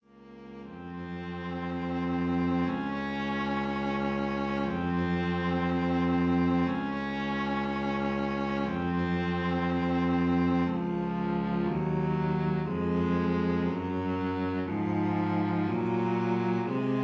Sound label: Wedding March